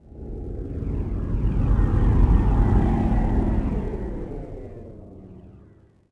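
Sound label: traffic by exterior; Star Wars Obi Wan; XBOX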